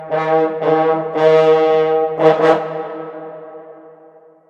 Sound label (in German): PF Bass, parforcehornmusik